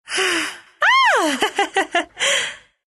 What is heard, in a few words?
laugh, ru; Dota 2